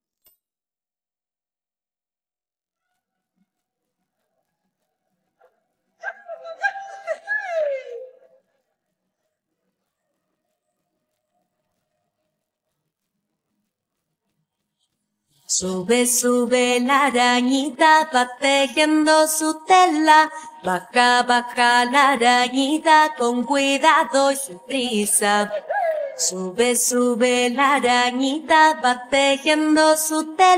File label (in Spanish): huayno de la arañita, cancion infantil, vocals